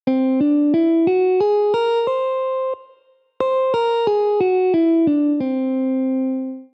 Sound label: Whole Tone